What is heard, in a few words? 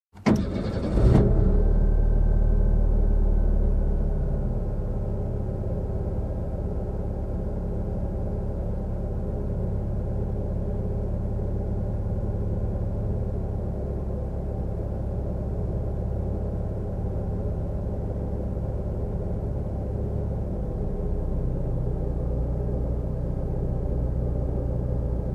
Car engine start